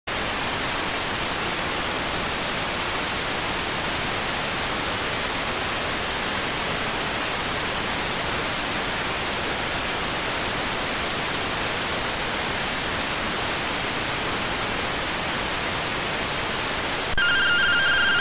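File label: radioteletype receive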